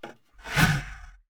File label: fallen strike